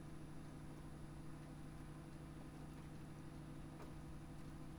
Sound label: Hydrophone